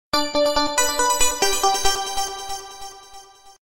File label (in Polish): elektroniczny dzwonek